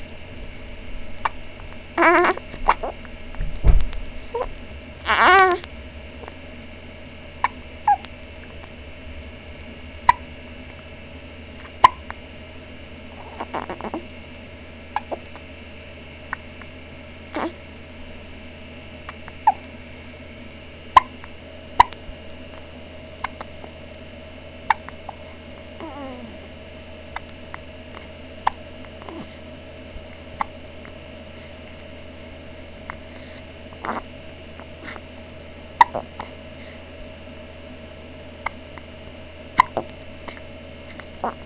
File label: HICCUP